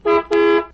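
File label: muffled sound of car horn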